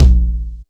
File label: puffy bass drum a sharp key